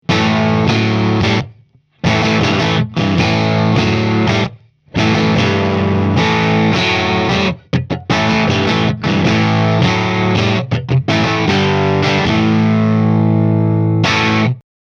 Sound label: fender 57 deluxe